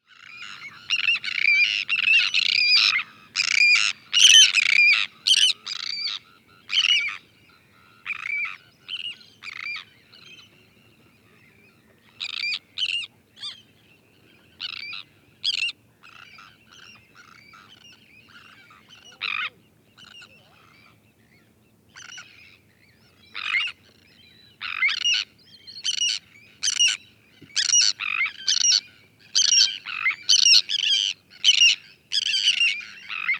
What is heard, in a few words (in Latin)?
Thalasseus sandvicensis, chants des oiseaux; Guadeloupe